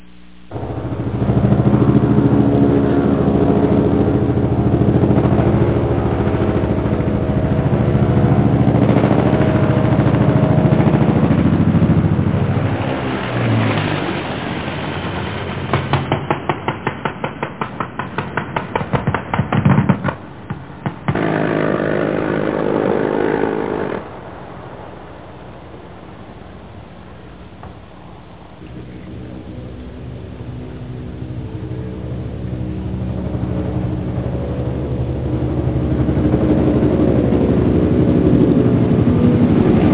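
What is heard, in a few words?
helicopter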